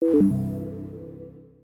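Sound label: deafen notification sound; winter discord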